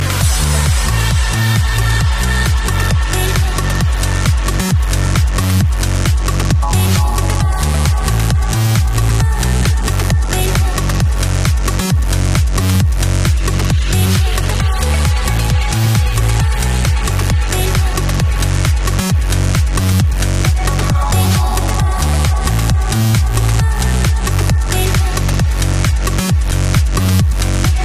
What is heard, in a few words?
trance